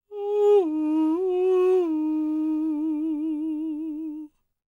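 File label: falsetto, Vocal Planet Jazz FX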